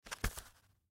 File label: Extension Cord In Package Set Down Carpet; Christmas Decoration Holiday; Foley; Miscellaneous Electronic; Blastwave